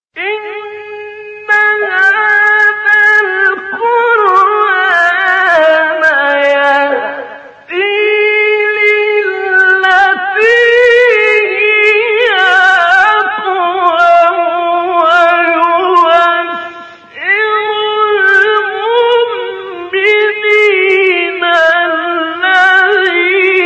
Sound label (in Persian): تلاوت آیه سوره اسرا; شاهکار استاد شعیشع